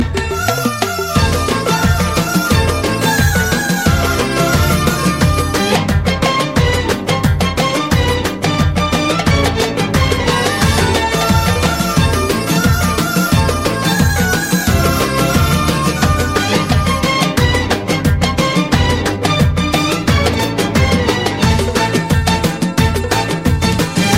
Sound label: Arabic Flute N Drums